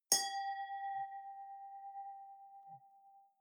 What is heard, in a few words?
wine glass hit ring fluctuate